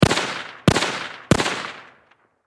Rifle .30 Caliber M1 Carbine Semi Automatic Triple Shot Distant Perspective; Warfare